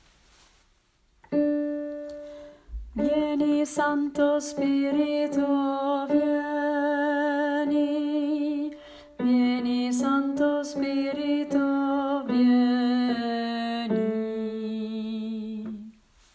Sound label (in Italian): Contralti; Vieni Santo Spirito; coro diocesano